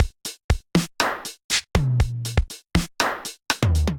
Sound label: DMX Kit, Drum Machines; DMX Admission Kit; Ableton; Factory Packs; Drum Essentials